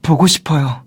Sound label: happy; seven